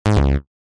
multimedia game sound basic digital retro incorrect error negative